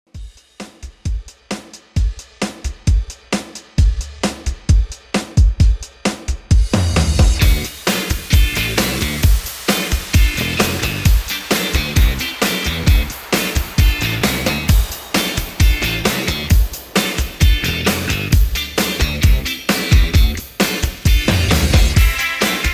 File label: 80s Rock Redrum 132 bpm